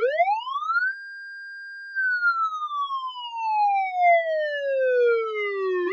police car siren; Original TK Sounds

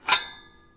door loop; Golden Crayon Sounds

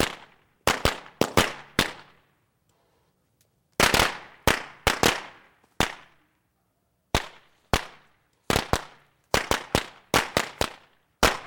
firecrackers; Original TK Sounds

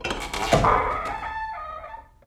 door open; Golden Crayon Sounds

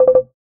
listbox; ChromeVox Classic Sounds

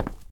concrete step; Golden Crayon Sounds

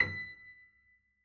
piano b; Golden Crayon Sounds

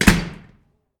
door close; Golden Crayon Sounds

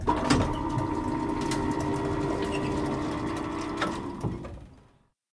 elevator close; Golden Crayon Sounds

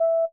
BEEP; iOS Sounds

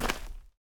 dirt step; Golden Crayon Sounds

